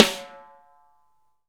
SNARES DRY; Real Mega Drums

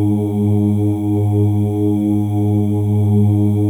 M+F OOS; Spectrasonics; Symphony of Voices

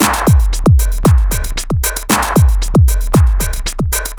Liquid Elektronique, Drums Beats

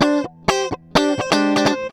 GUITAR LICK; Ueberschall; Jam Box

